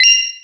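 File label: Battle Passive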